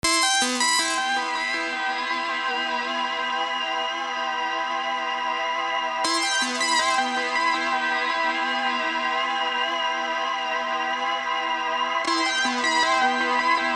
quarantine destruction loops sirensynt; weeklybeats-2020

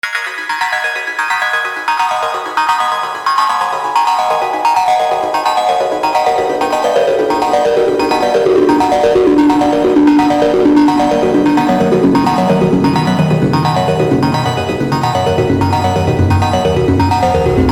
fart cave; weeklybeats-2020

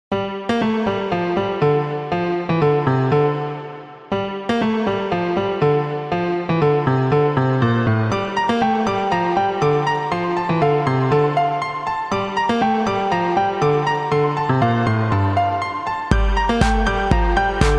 the dissonant jig; weeklybeats 2024

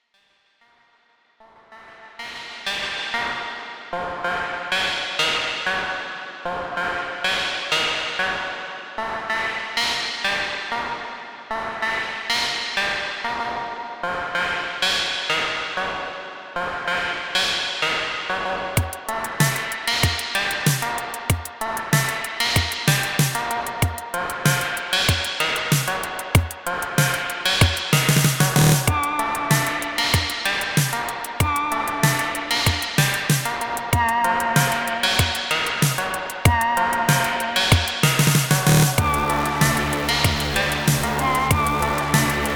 dark trance; weeklybeats 2024

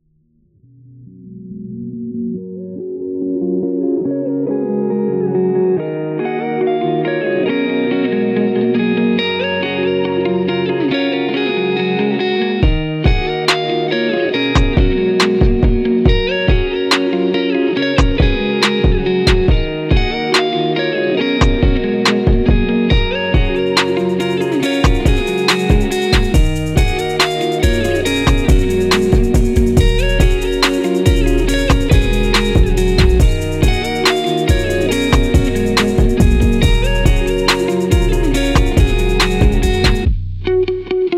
emo lofi beat; weeklybeats 2024

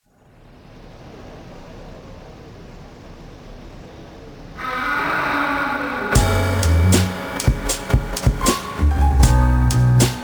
lo-fi hiphop with drunken beats; weeklybeats 2024

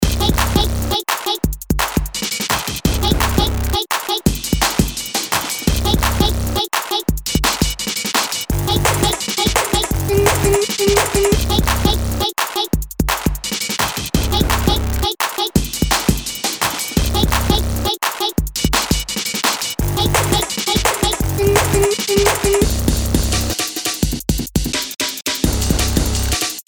large bass; weeklybeats-2014

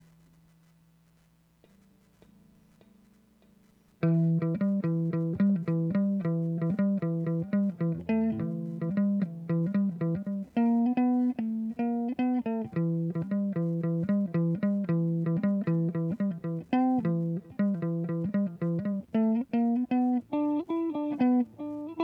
blues scale improv

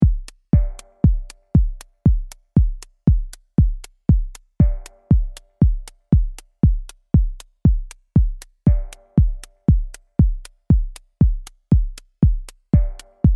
sine challenge; weeklybeats 2014

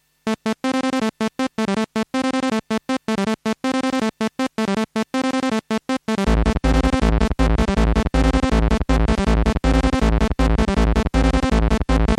crush beat overdrive; weeklybeats-2016

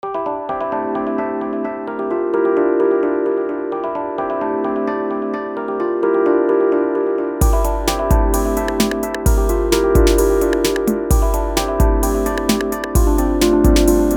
808s and chill waves; weeklybeats-2020

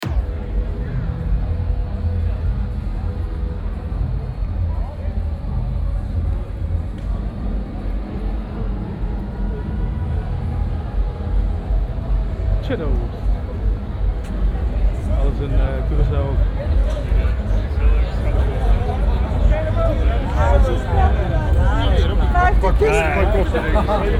crowd nijmegen vierdaagse; weeklybeats-2016

